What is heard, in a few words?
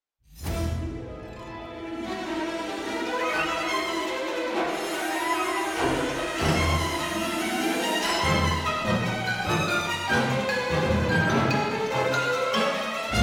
Bats Take Flight